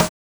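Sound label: mod snare, Synths and FX, Mod Drums; neuro; Sinistarr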